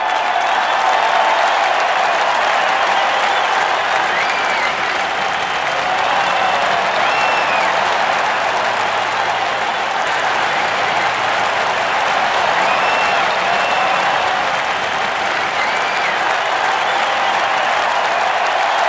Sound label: crowd